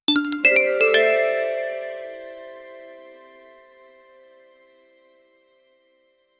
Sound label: a sparkling three note ji; gameplay